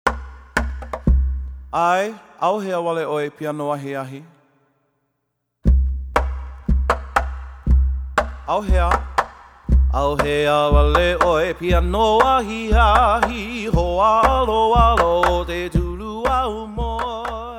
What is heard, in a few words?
piano